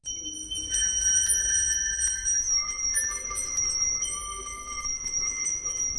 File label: gentle flute music; bells